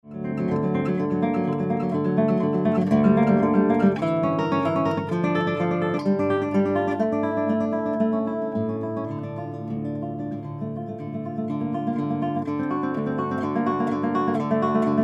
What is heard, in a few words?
Ciaccona, Partita n. 2 BWV 1004 for violin; Johann Sebastian Bach ( 1685- 1750)